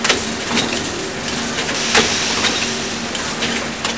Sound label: factory; The Elder Scrolls III Morrowind GOTY; XBOX